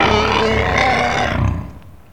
Dinosaur

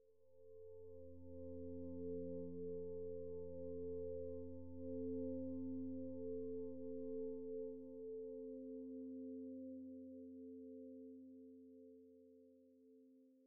Ambient Echo Sound

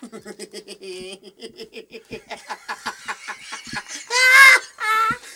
Laughter Male Derride

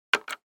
Button

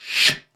Woosh Slide In

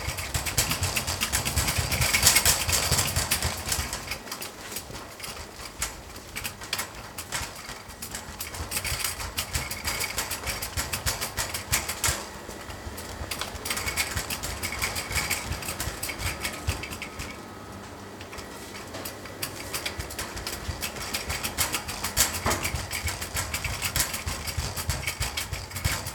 Shopping Cart At Grocery

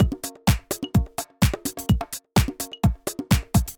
House 127 With Congo

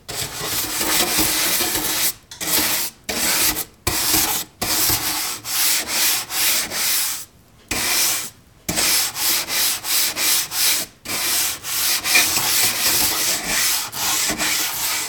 Brush Scrubbing

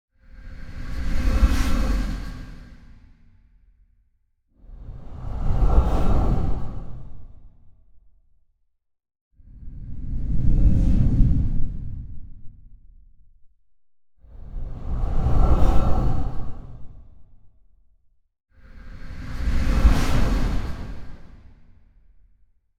5 Everyday Whooshes

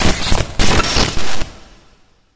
Electric Sparks

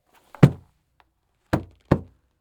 Caida Thud

